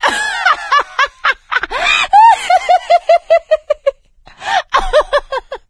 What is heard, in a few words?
laughter, survivor, voice; source engine; left 4 dead 2; producer